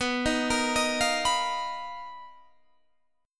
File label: B Major 7th Flat 5th B down Guitar Standard; BM7b5; arpeggiated; JGuitar